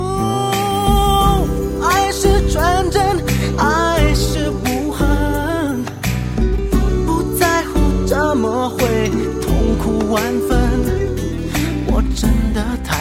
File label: Chinese Song